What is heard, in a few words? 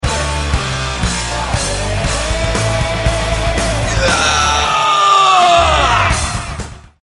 Headbanging Wake Up Alarm Heavy Metal Music